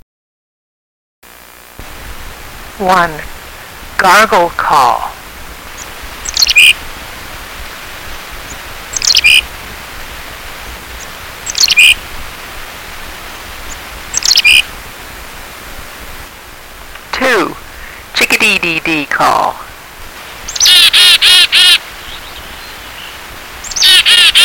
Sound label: chickadee, birds; birds-and-frogs